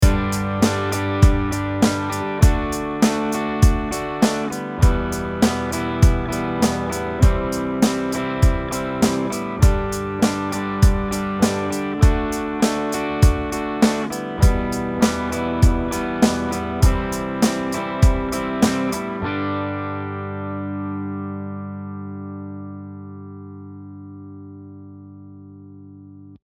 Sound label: Simple Drums